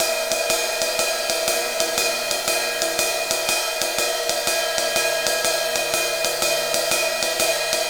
Ride Cymbal Pattern

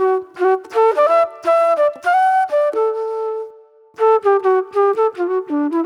Classic Soul Flute; Sonic Foundry (Sony Creative Software); Manhattan Flutes; Soul 1

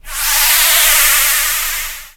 noise, production effects

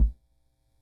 Guild MG10C-1 Kick, Drum Machines; Guild MG10C-1 Sample Pack; 300 Drum Machines

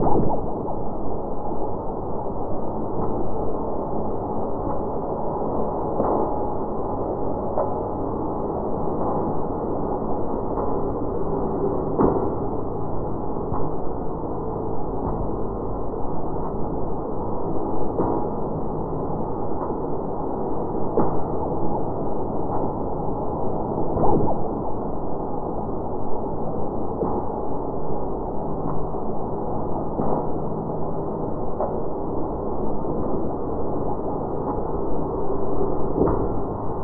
QUANTUM MECHANICS DRONE, DEEP ATMOSPHERICS; Sonic Foundry (Sony Creative Software); Vir Unis Infusion

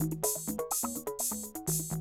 125, Classic Drum Loops

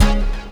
hit, brass

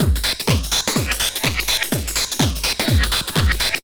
backslide, Deep House 125bpm

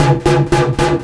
REACTOR, REACTOR CORE; Spectrasonic Distorted Reality 1

